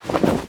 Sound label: Cloth Destroyed; Angry Birds Rio